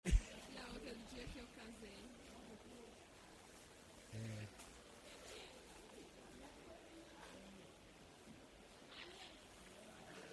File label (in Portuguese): caxambu no dia